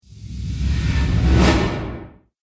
Flashback Transition Whoosh

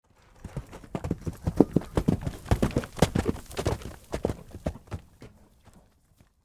Horse Clydesdale, Pass, By, Trot; Animal; Horses; BlastWave

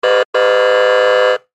Ambulance Horn, Ambulance Horn Siren Beep; Emergency; Emergency Vehicles

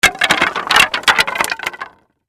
Crash Debris Wood Stack Drop Spill Planks; Blastwave

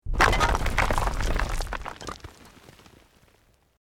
ROCK DIRT SLIDE SMALL

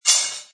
Metal Peg rattle; Metallic Sound Sound Effects; Multimedia; Lynne Music

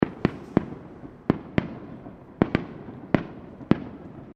Fireworks Small Display Firecrackers; Crafted Signals

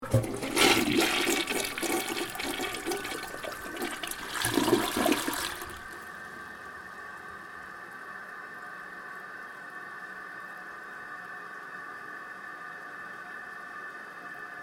Toilet Flush, Household, Toilet, Flush; Bathroom Sound Effects; Bathroom (Sound Effects:Household); BlastWave

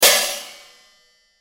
High Hat Broken, High Hat Broken Hit; Cymbals; Blastwave

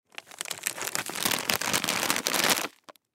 Pretzel Bag Handle; Food; Foley; Food Pretzel Bag Handle Movement; Household; Blastwave

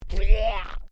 Created creature small yech; Created creatures, vocalisations and pretend speech; Aliens

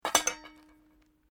Bowl Metal Object Dropped Into; Various kitchen bowls; Materials

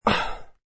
Exhale, An exhale; Exhaling (Sound Effects:Voice)

